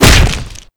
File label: hit; chriswalker; slender